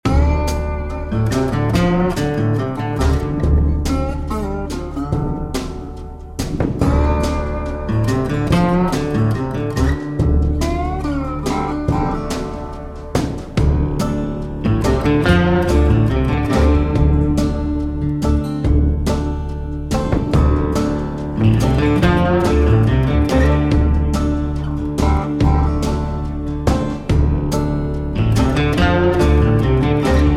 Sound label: blues electric; music on hold samples